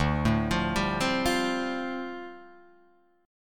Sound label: D Minor 11th D down Guitar Drop D